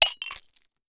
glass break two thick glasses

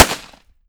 45 ACP 1911 Pistol Gunshot; Weapons; Gunshots

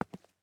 footsteps tennis shoes walk; Player Character SFX

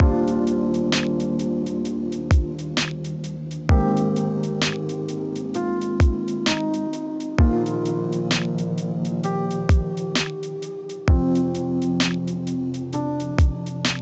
Lo-Fi

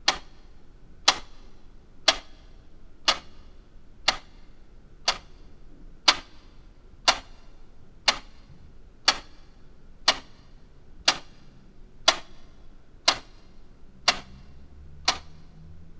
clock ticking exact looping

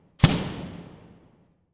t shirt cannon

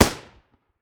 machine gun m240b single shot close perspective

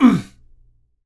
grunt male; enemy

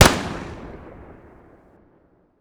pistol 44 magnum single shot exterior

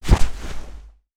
Bail Intense, Skateboard; Foley Sports

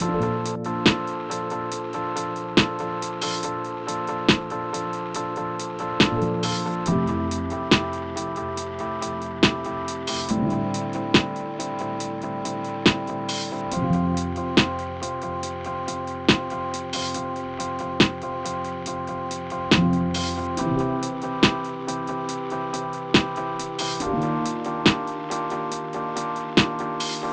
Lo-Fi Wash Over

